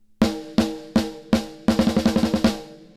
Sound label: snare